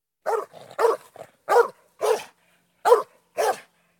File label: Dog Bark; the 39 steps